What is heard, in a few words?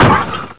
damage, car; q3rally